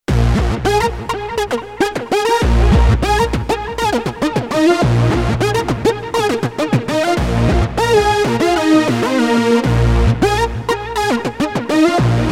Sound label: Power Lead